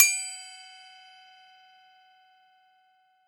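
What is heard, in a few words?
Cup Chime; Roland SPD-S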